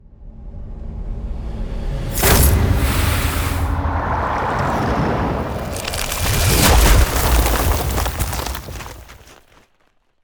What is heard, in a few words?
Ice Spell Barrier Defense Frozen Dome; Arctic Aura